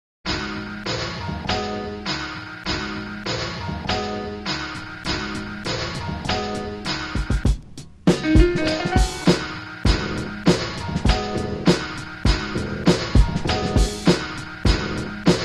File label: hip hop beat